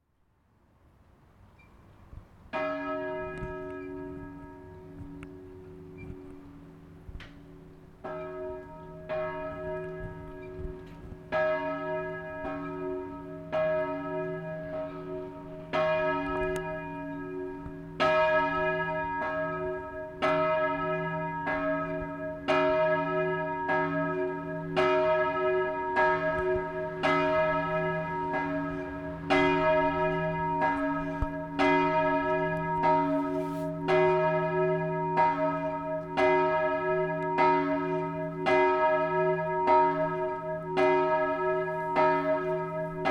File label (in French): cloche; Zell cloche-1